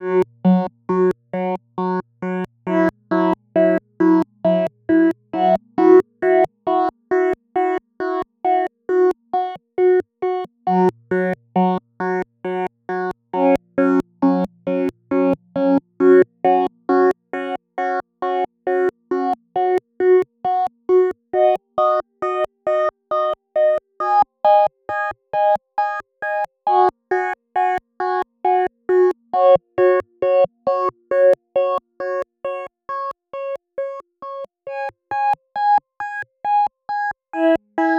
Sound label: Dm90 PULSE